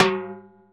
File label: snare, Roland MC-909